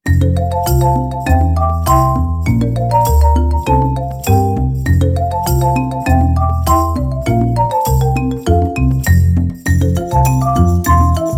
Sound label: Bubble Shower; Meitu; M2; ringtones